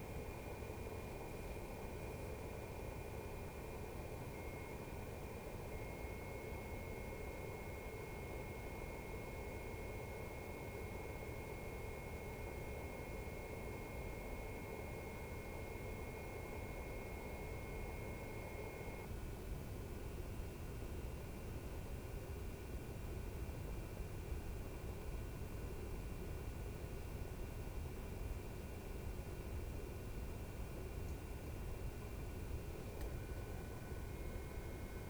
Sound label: mac studio fan noise